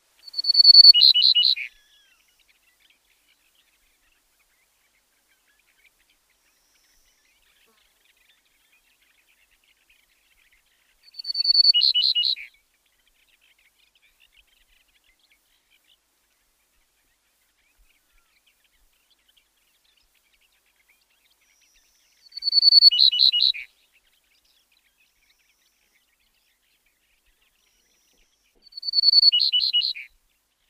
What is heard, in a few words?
Grey Necked Bunting song